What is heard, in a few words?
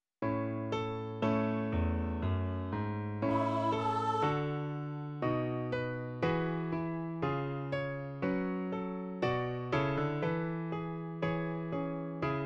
fourths novoice